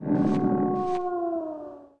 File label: Hungry Wolf Idle